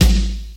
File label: 80s verby kickdrum g sharp key